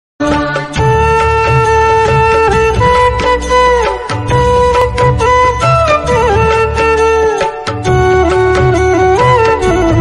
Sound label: flute ringtone devotional ringtone; gayathri mantra; radhe krishna